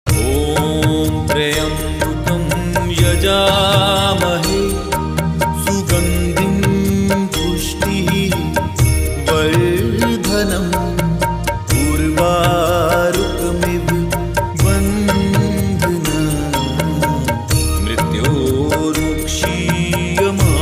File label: om tryambakam Mantra; om tryambakam yajamahe